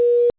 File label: Barge Tone, IP Phones; Cisco IP Phones 7942G; English; IOS